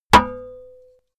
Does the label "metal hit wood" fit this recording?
Yes